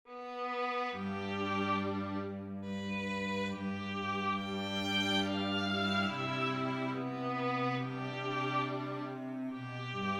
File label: String Quartet